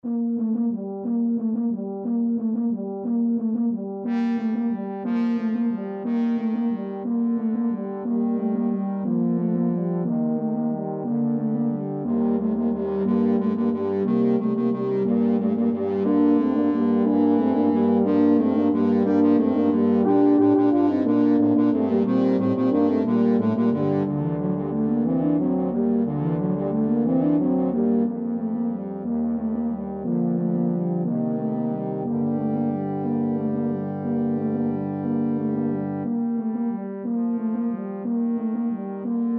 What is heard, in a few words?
lower brass quartet